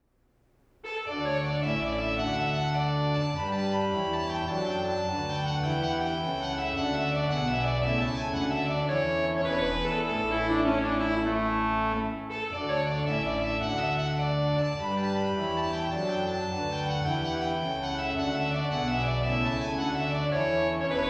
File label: Tuba Tune; wedding recessionals; C.S. Lang